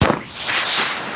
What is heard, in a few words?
smoke start; firearms